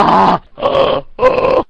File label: gasp; phx new taunts pack; props taunts classic